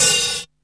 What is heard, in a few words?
MET HIT; WORS STATION; BODY BEAT